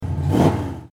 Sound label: Muscle Car Revs; Business skieme Beta 1